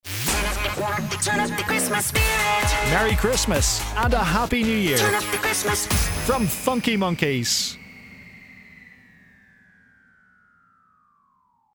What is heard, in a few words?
Radio Xmas Jingles; FM Radio; Xmas Turn Up Xmas